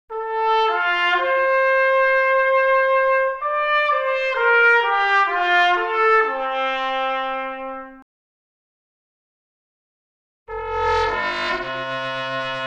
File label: tpt